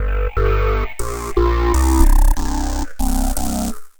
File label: Mercant Bass, Bass Foundations; Sonic Foundry (Sony Creative Software); The Electronic Music Manuscript Collection